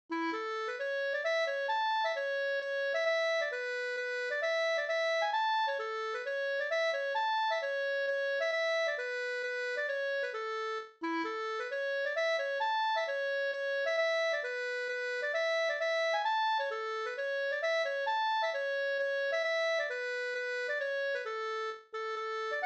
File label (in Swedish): hambopolska; fr An